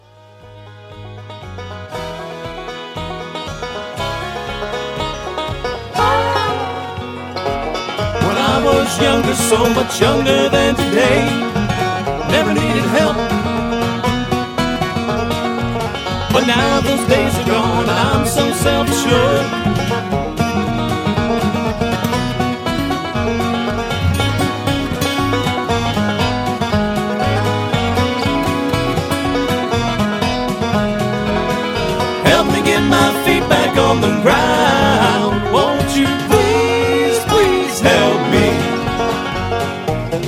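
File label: Instrumental with background vocals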